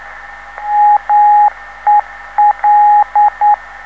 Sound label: MEL 394kHz